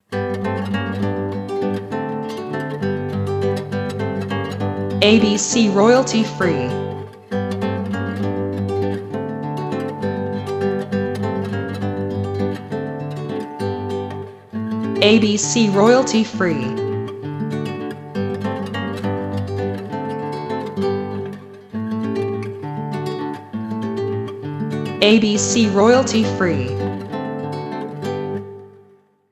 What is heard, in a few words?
waltz